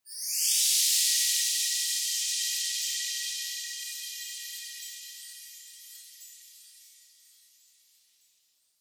Scene Flashback Long

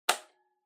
Portable Cassette Stop

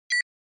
Short Accent Single; ショートアクセント; シングル

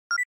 Short Accent Dry

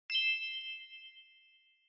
Bell Accent, High Dry

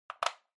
Calculator (Battery Insert)

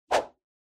Motion Swish; モーション スイング

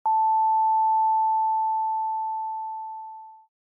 Time Signal Beep Short

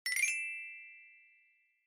Glocken High Short

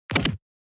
Fracture Dry; 骨折; ドライ

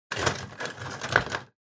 Pen Holder (Rummage)

